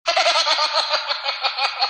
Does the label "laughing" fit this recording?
Yes